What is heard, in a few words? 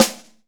SD 1M L, DRUMKIT